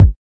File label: kik